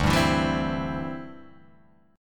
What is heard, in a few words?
D 7th Suspended 2nd Suspended 4th, Guitar Open G